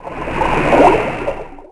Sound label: Fish Pass By; Finding Nemo; XBOX